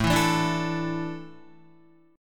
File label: A Suspended 4th down Guitar; Asus4 strummed; JGuitar